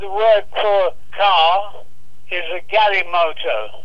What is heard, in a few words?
Car